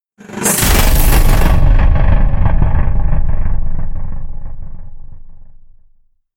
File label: hit shred zigzag